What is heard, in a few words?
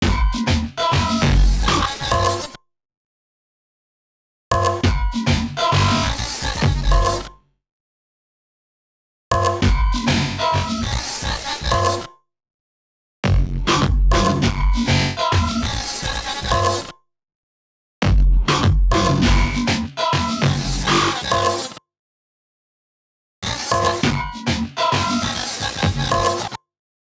beat loop, 100